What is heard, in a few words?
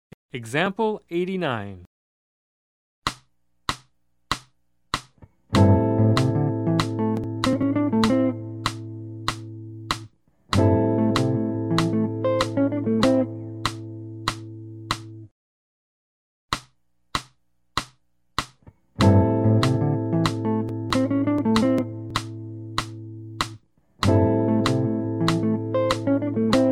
Jazz; jue shi ji ta